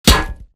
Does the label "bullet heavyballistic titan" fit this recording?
Yes